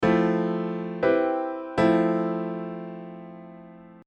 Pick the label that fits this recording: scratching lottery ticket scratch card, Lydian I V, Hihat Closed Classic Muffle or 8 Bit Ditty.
Lydian I V